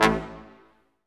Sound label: BRS Quintet staccato, Roland; Roland L-CD702